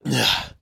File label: breath; watertowerclassic